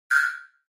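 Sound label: Flash Beep Electronic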